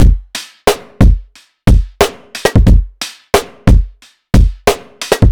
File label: Snare